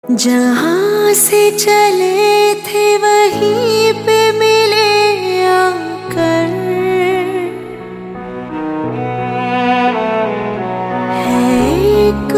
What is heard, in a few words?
Hindi Ringtone; Auron Mein Kahan Dum Tha